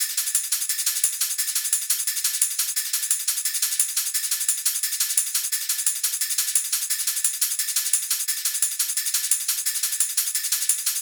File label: PRCLP, Percussion Loops; neuro; Stanza; Drums